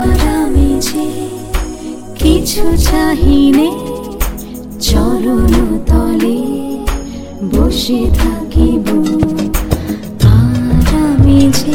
Rabindrasangeet

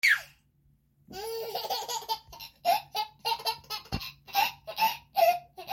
Short giggle

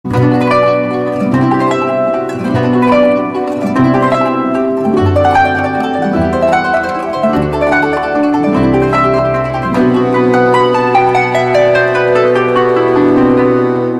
Kanun Intro

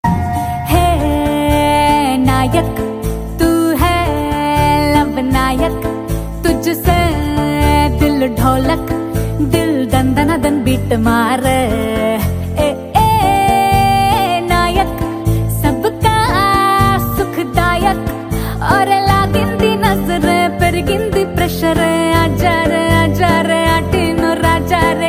Telugu

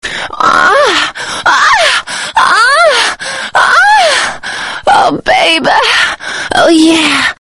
Watch Out Ringtone

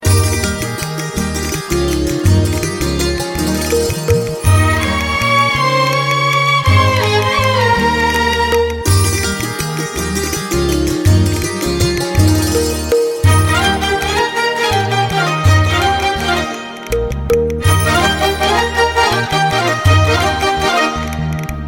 Santoor Rhythm

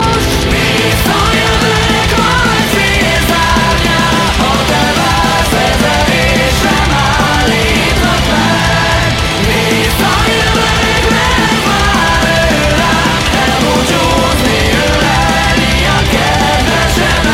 Folk Metal

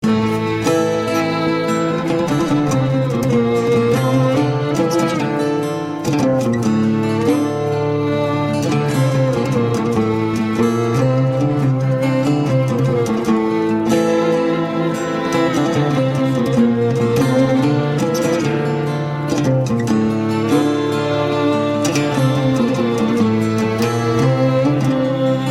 Ud Tone

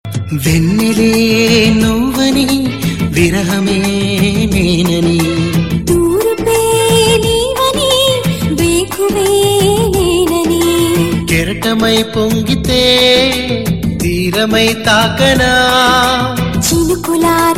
Telugu Melody

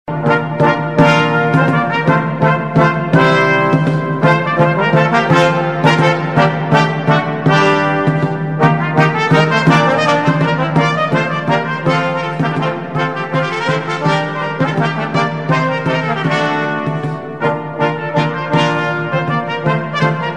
Baroque

